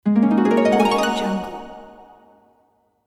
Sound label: magical spell